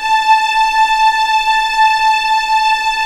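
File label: A5 legato piano, violins; East West; The Ultimate String Collection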